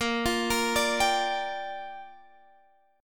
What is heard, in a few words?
G Minor 7th, Bb